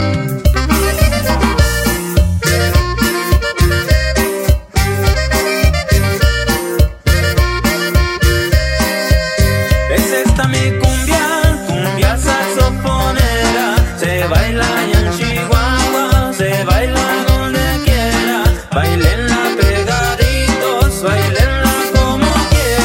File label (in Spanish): cumbia saxofonera